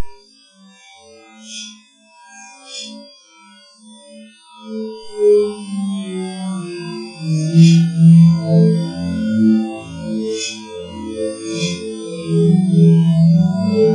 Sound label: ghostly tunes